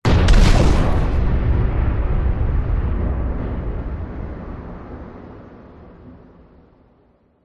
explosion designed large heavy with small shockwave